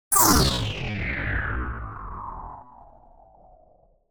Space Ship By - Buzzing - Turn Of The Engine - Short; Hollywood Edge; sound designer's toolkit v2